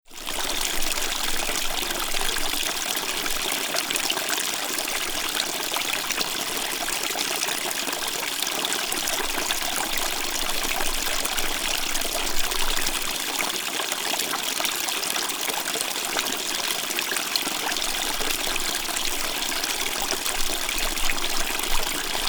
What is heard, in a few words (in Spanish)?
Fuente